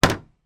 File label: household bin small wheelie bin lid close; sound effects one